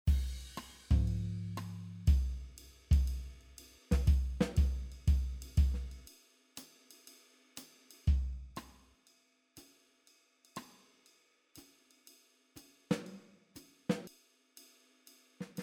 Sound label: MOOER Drummer Jazz Pattern Stereo Out